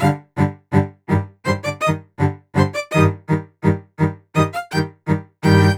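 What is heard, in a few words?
083 South Hip Hop String Quartett G minor; Strings; Pro Tools Essential Loops; Digidesign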